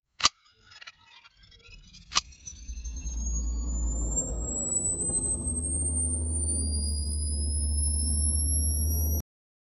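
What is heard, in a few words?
camera whip left seamless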